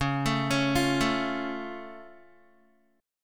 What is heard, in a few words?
Db Augmented, down, Guitar; arpeggiated